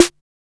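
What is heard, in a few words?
dull snare; Drums In Your FACE!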